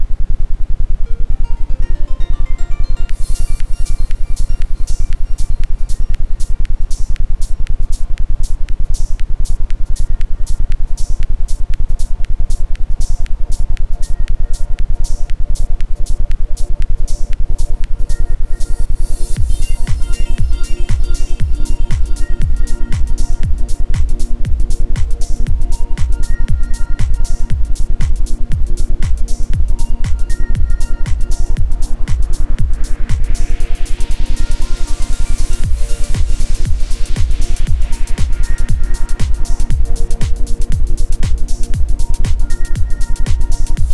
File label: isochronic, Ambient Sound; Energie Antrieb Quickie